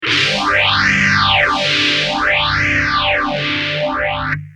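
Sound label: guitar phase II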